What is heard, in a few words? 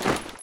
taunt yeti standee equipment jingle; video games; source engine; tf